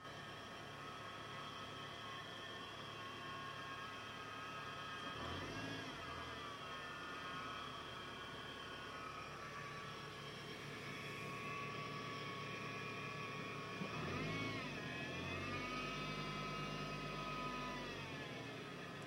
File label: starter going fit noise; 1st generation gd 01-08